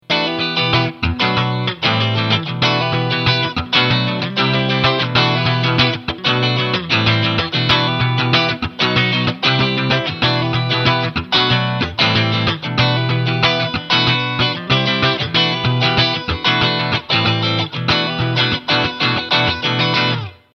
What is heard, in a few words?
Funk lick